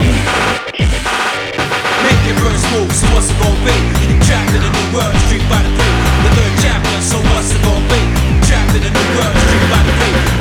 High Score Table; Street Fighter III 3rd Strike Arranged Soundtrack

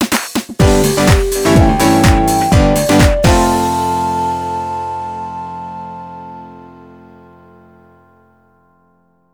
Power and Speed Clear; Gran Turismo 4

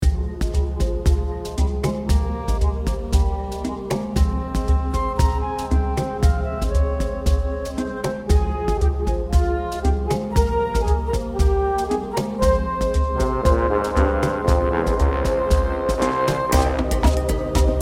BGM cave; gamerip; Witch Spring 2; Android, iOS

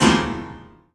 break piano keys; stranglehold

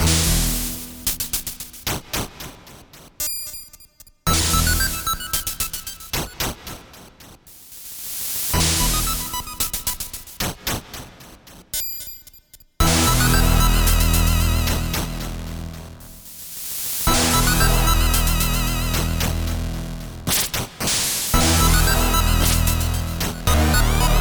music cellar loop; Skellboy

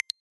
Click; Gimkit; Android

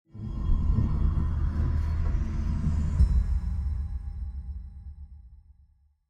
Rhythm Temple SFX Hatch Door Left; Mountain SFX

